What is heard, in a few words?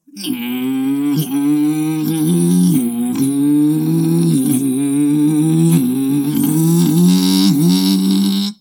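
mouth sounds used in animation of car races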